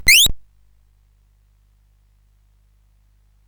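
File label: Moon Patrol, MOS 8580 SID chip music, games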